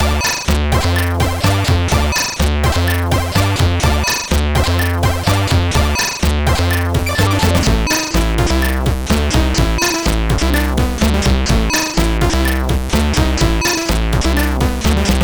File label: sid, CSG8580R5